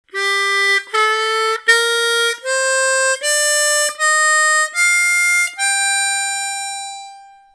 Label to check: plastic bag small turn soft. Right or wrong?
Wrong